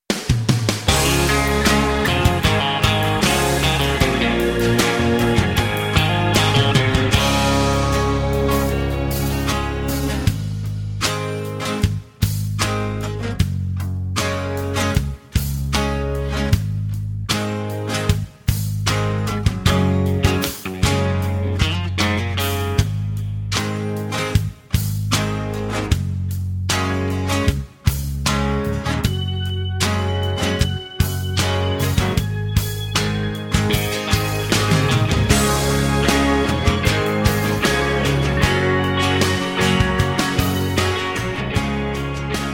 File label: (Db) No Backing Vocals